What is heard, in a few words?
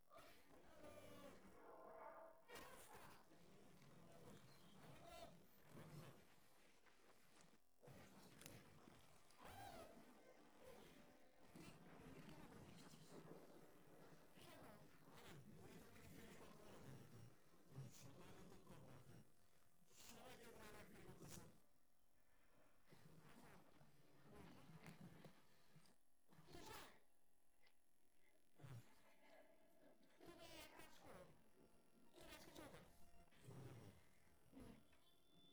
no vocals